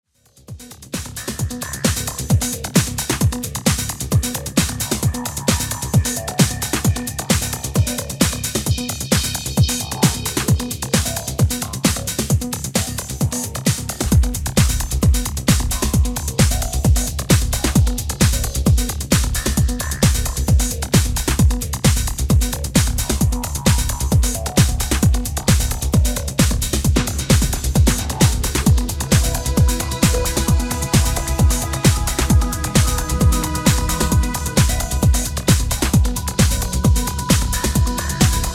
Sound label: Eversines Distant